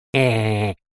male laugh cartoon like snigger sly

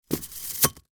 toy slinky metal down stairs single

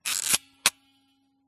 smena symbol camera wind film lever; dpa4060; PMSFX

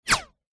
bullet pass by; zapsplat

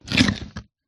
animals horse bite single carrot chew munch; zapsplat

